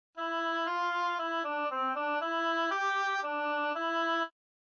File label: oboe; oboe (anatra)